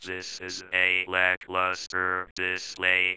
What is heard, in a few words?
Robotoid